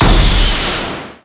missile fire; weapons; Stellaris

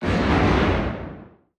distance explosion; weapons; Stellaris

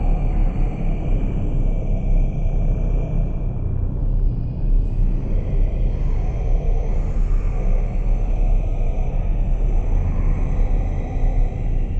ship colossus idle; Stellaris; shroud; ships

